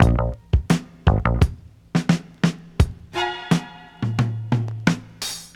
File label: 86 bpm fresh dance breakbeat sample a sharp key; drum loops